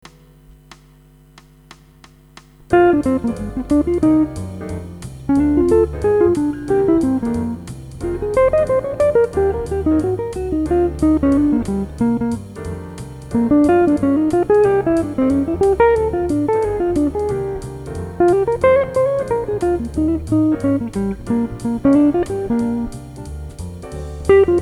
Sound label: Rhythm Changes 180